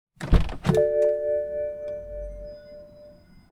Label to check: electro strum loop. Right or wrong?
Wrong